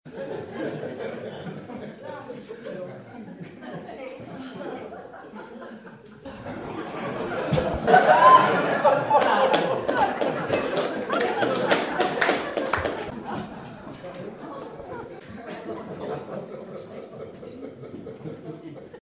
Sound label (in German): lachsack